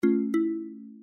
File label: drop